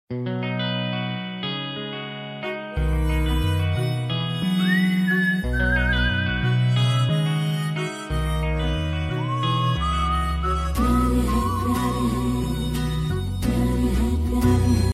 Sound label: whistle ringtone